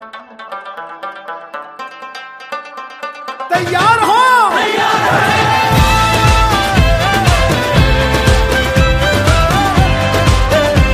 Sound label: Anthem Ringtone; PSL 2020